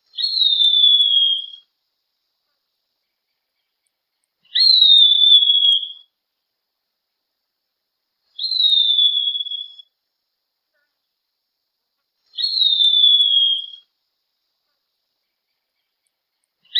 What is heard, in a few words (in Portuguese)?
Canto do Gaviao de cauda curta